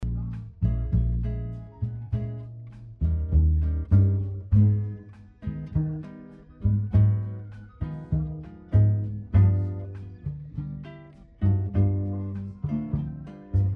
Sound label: Mainly Bass